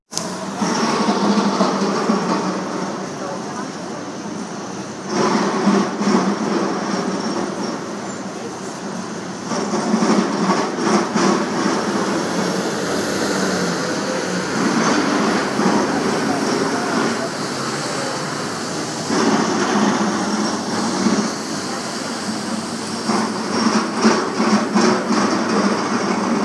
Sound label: drill; Chalottenburg